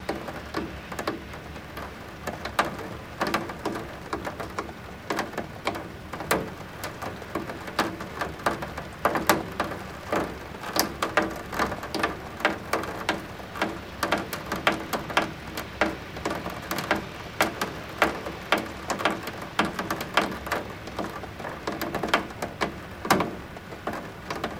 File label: Rain Fall Light